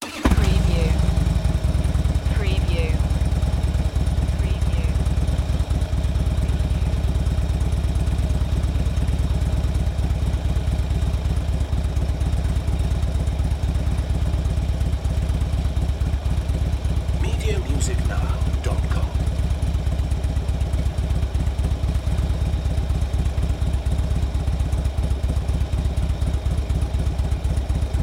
Motorcycle Harley Davidson Start Up Idle Shut Off Engine Perspective